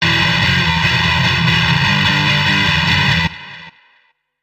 Heavy Guitar Sting

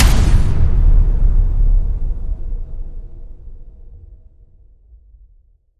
hit big

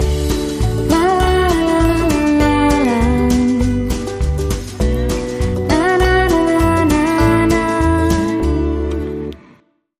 Intro Vocals

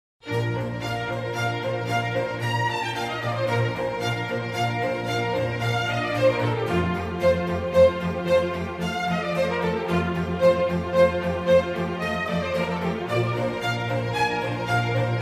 Concerto for Strings in B flat major; RV164; Antonio Vivaldi

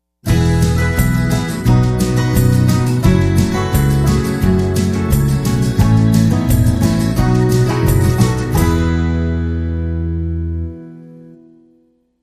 Rustic Sting

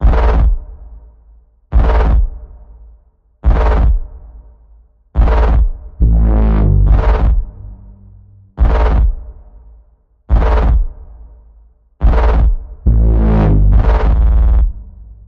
Evil Warping Bass Pulse A, Key of A

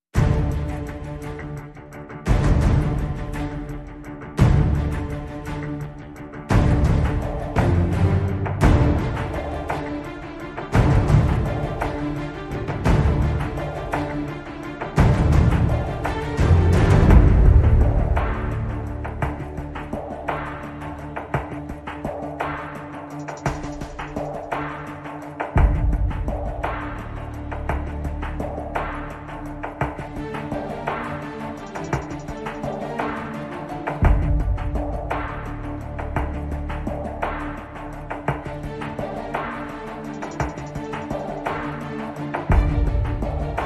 No Brass

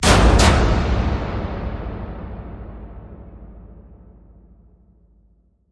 Hit Empty Warehouse

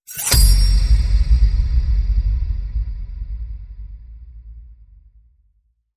Cold Skin Knife Boom

